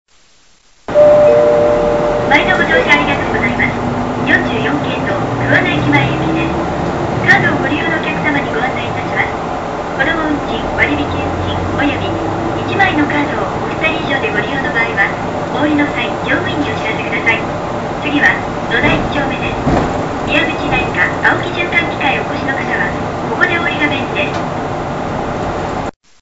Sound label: bus tape orgel; mie; sanco